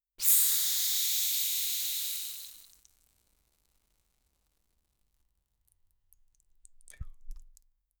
body

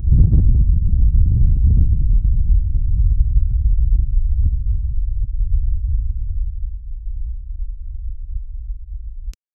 the rumble of a collapse, mining

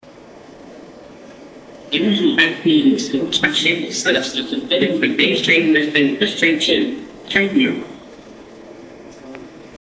a news opening sound from, media

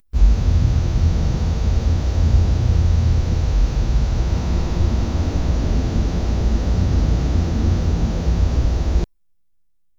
deep resonant echo of an, wooden creak

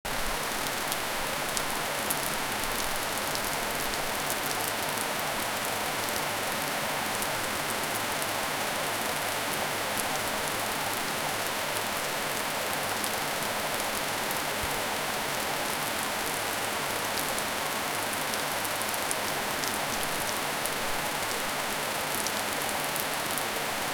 rain in the beach jacuzzi

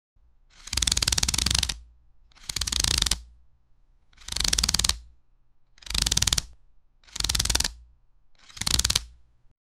ancient page flipping sou; ambience

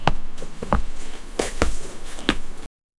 walking in boots

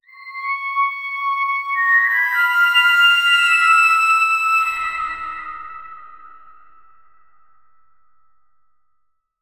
halloween theme for spook, haunted house

